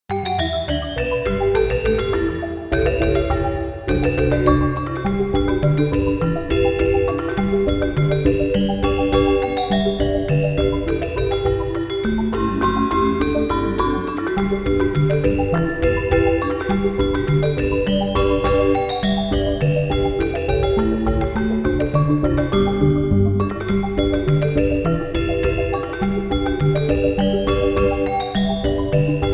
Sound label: Yankee Bird March, marimba